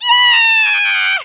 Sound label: Shout; Fortune-Teller: "Yeeaahhh!" (telling Link's fortune); zelda